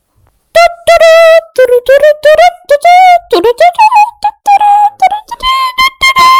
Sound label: intro scream